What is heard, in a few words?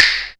PERC FX; Back In Time Records - Big Bang